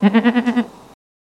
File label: Goat Baah, Neigh